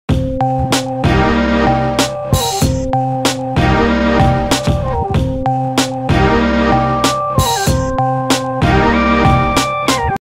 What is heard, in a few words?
Short intro music